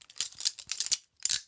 cuff start, Handcuffs

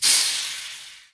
soda spray

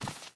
carpet barefoot, Footsteps

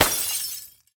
glass break

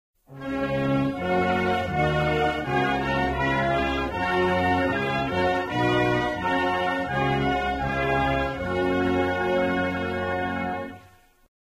dutch anthem; Civ; Music

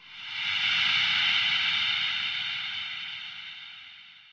smoke grenade smoke

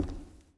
floor, Footsteps; civ13; civ14

